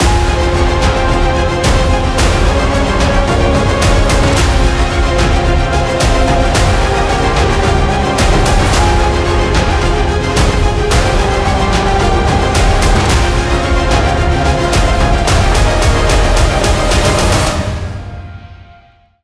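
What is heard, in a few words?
tension cinematic; By Burak Sound Bank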